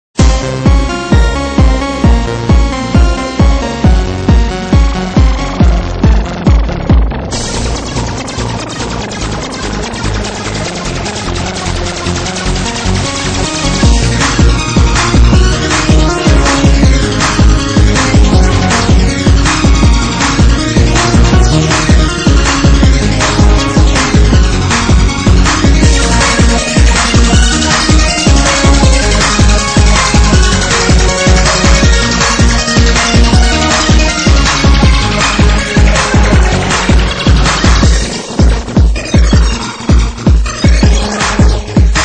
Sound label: trance thunder